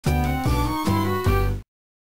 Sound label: Transition; ratatouille gba 2007